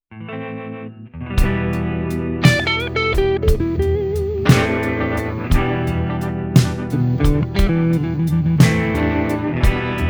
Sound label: blues